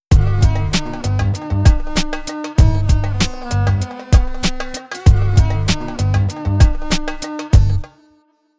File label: jingle